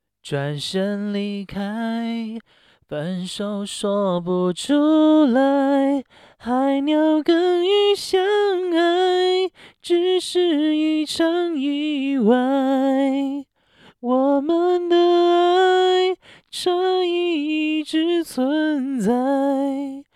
唱歌样音

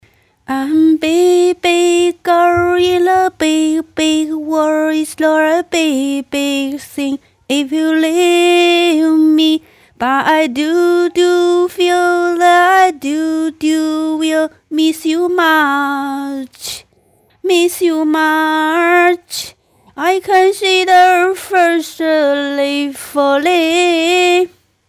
英文歌曲

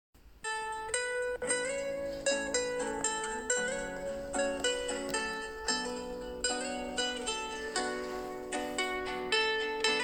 歌曲

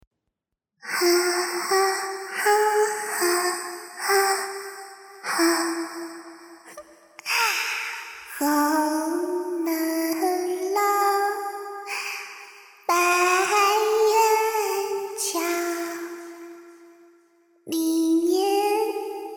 恐怖女童哼唱

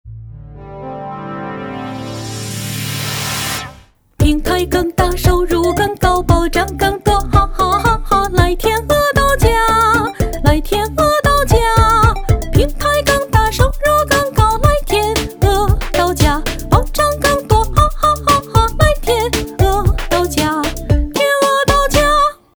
品牌广告歌; 天鹅到家

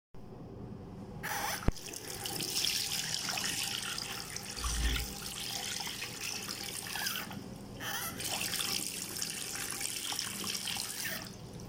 流水声

